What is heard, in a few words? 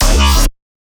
Bass Growl Slidey 200 C#; Jino